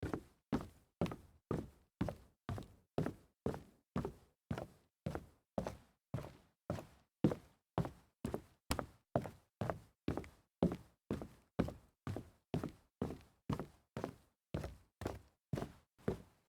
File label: sneaker fast walk solid wood